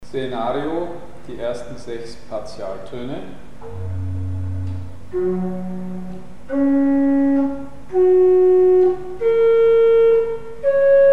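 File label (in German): Senario Partialtöne 1 - 6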